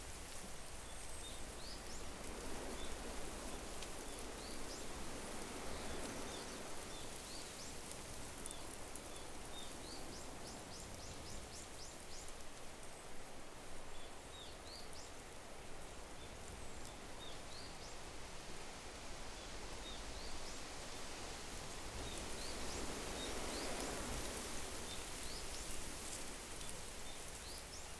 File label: outdoor grassland breeze birds; anemoi